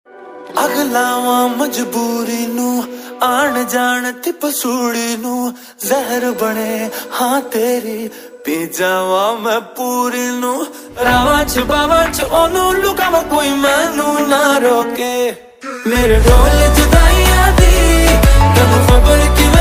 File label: panjabi ringtone